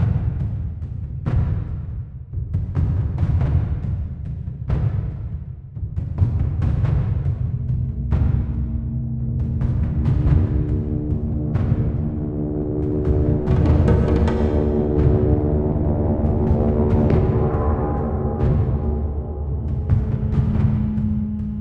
Action Loop

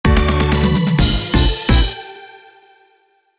Jingle Boss Start

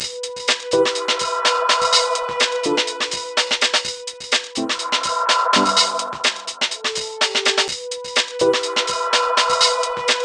Wind Tunnel; Knia WindTunnel; N+ soundtrack; DS PSP

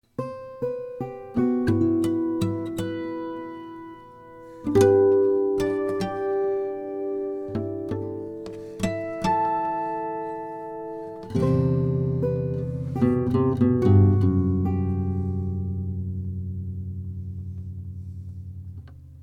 Camp Guitar A; Torchlight III; PS4

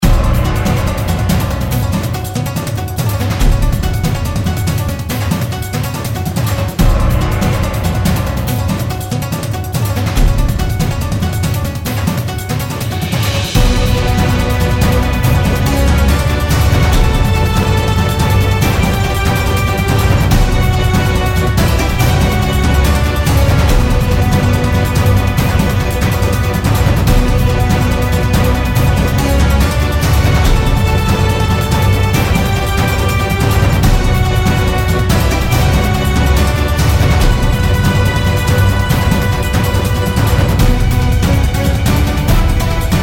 Raid; Mist Train Girls

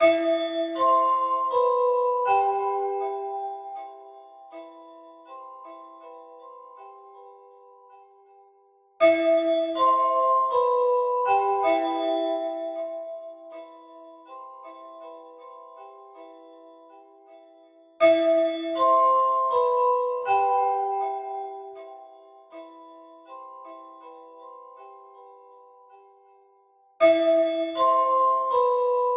Music Box simple loop; Unrest Indigo

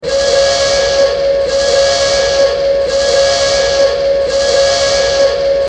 Boss Emerge; qualification of hero collecting princesses ios gamerip 2016

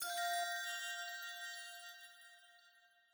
Alchemy Flute Combo

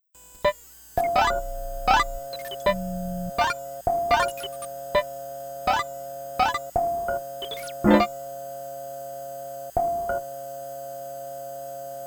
Waltz; lost tapes; hirokazu tanaka

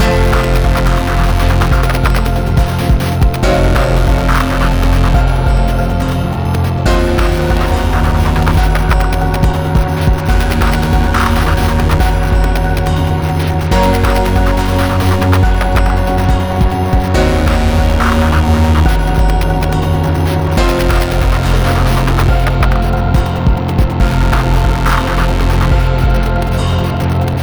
Glitch sonata Medium; rive wreck hack die retry; ps4

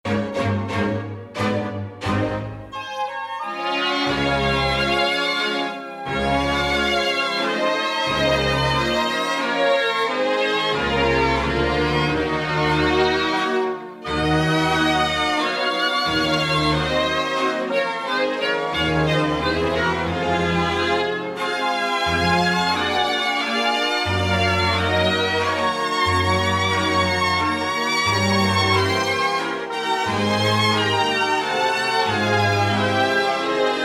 A Waltz - Very Bad; paper mario the thousand year door

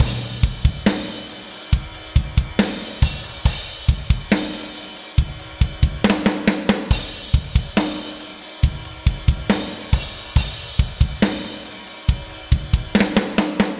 Drums and Jingles Camping Drums

